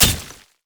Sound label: basic attacks; quinn